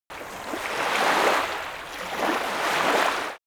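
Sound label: Water waves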